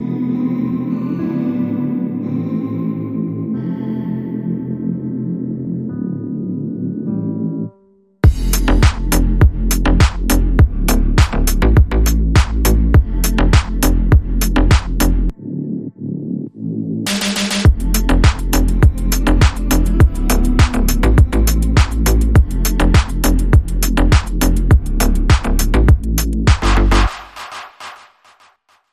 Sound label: Phonky Tonk Phonk, Rhythm